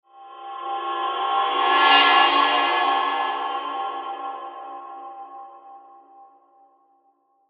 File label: Mysterious Metal Transition With Swoosh, scary soundscape; CraftedSignals